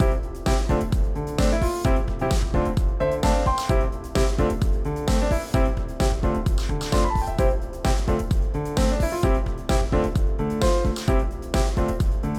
Upbeat Jingle Loop; gamerip; beautiful girl fight school

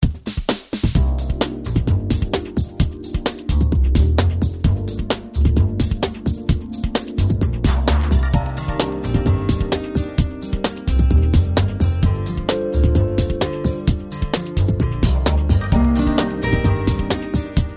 Loop; crime stories from the files of martin myst re

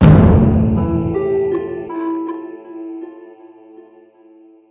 Dark Defeat; Dungeonrift

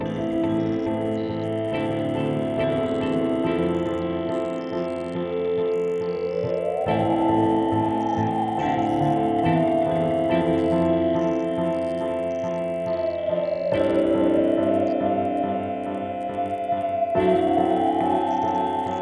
Trainride Stationary Slow; rive wreck hack die retry; ps4

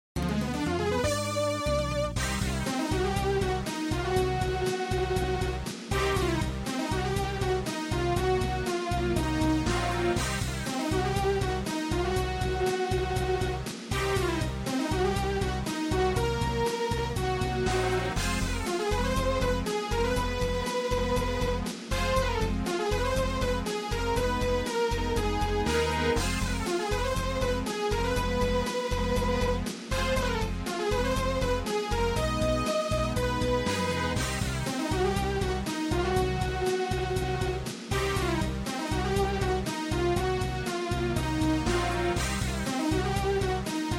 MIDI Battle

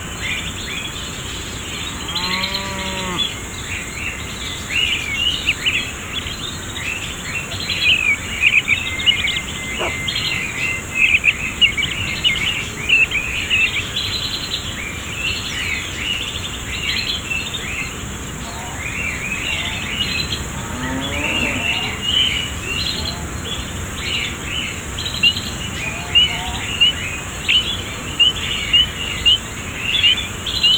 water balloon amb, ambience; Birthday Party Bash; Wii

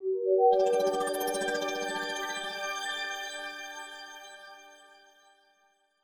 level Complete; Turn

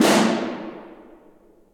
Snare

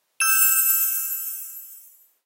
bubble w active; game rip; line bubble

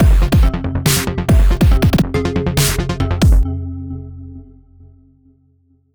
Industrial Action mood

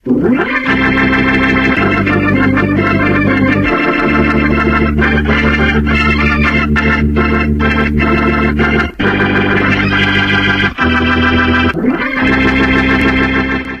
select station

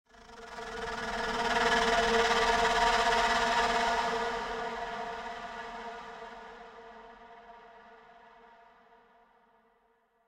Jingles FLUTE STING; Semblance

